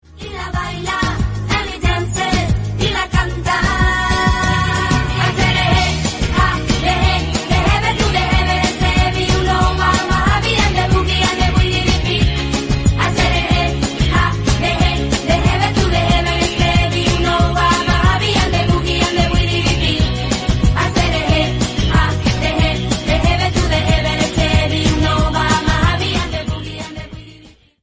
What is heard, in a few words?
English Song Ringtone